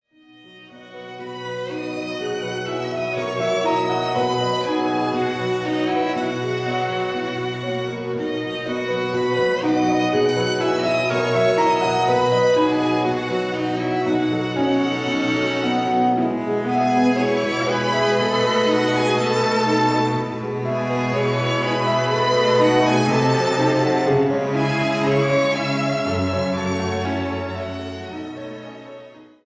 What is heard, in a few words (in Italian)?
Quintetto per pianoforte, due violini, viola e violoncello Allegro con fuoco; Alessandro Longo